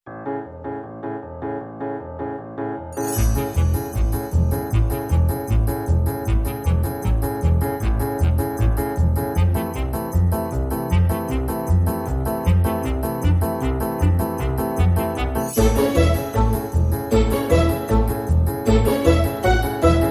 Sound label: No Drum Kit